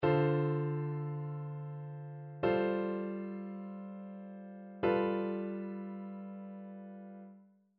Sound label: IIm7 V7sus V7